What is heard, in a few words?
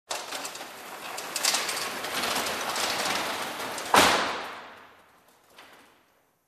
Store Metal gate close